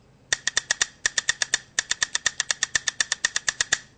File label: castanet; western